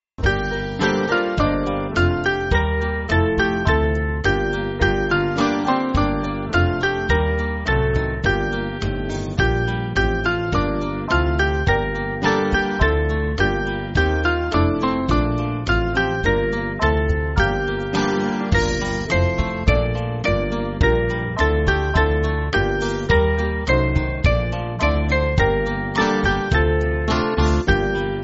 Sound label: Band, church music